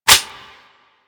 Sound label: Clip In, Rifles; Snipetron